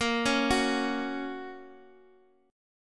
A sharp Minor Sharp 5th, Guitar; arpeggiated; 4A,4E,5A,5D,5G#,6C#; JGuitar

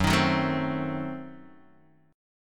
Gb Diminished 7th Gb, Guitar Open D; chordshape; down

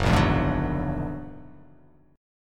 F sharp Augmented 9th F sharp, chord

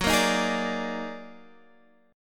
Eb Minor Major 11th

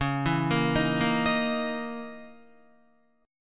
Db Minor 6th Add 9th Db down Guitar; Dbm6add9 arpeggiated; JGuitar

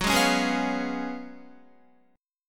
Gb Major 7th Suspended 2nd Suspended 4th, Guitar; GbM7sus2sus4 strummed; JGuitar

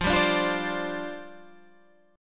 C Suspended 2nd Suspended 4th, F

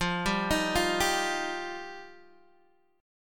F 7th Suspended 2nd F Guitar DADGAD; F7sus2; arpeggiated; x,8,5,8,8,5

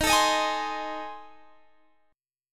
A Minor Major 7th Flat 5th Eb

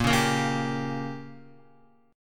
Bb Minor 6th, Guitar; strummed; JGuitar

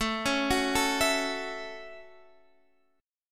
A 6th, Guitar Standard, chord; arpeggiated

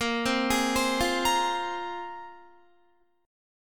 Bb 7th Suspended 2nd Bb down Guitar; Bb7sus2 arpeggiated

